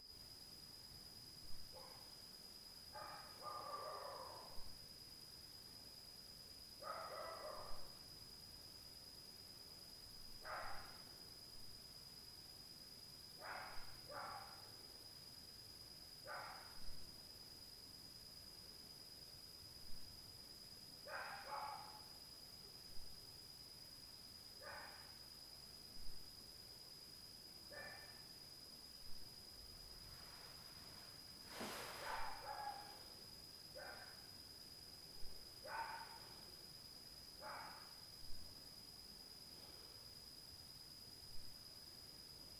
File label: DOG, Night Noise